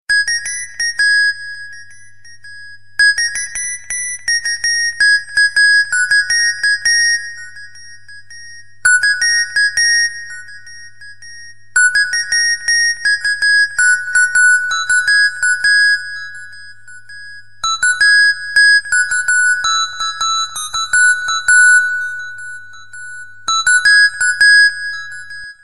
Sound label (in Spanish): nuevo tono agradable